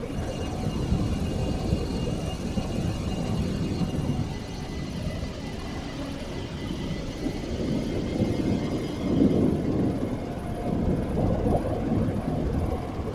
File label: pulsar star idle hum; ambient; Stellaris